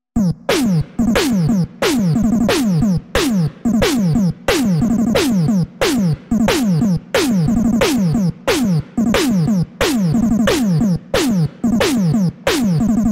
mmsoundtrack; Mega Man 2; gamesoundopedia

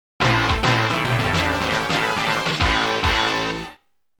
vs screen theme; Super Street Fighter II

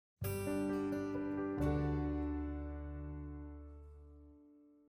mood fine; sims 4

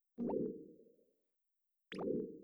buttons; battleblock theater

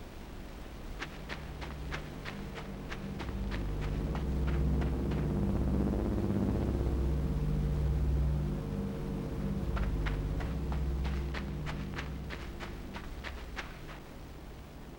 puzzle droning; limbo

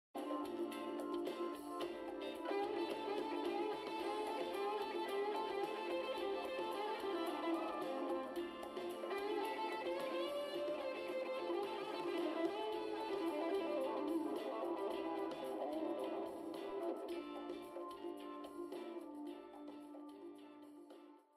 garage musik; Life is Strange 2; gamesoundopedia

